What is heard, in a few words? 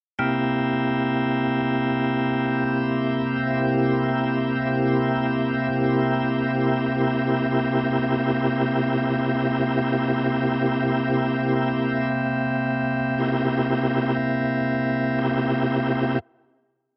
organ rotor control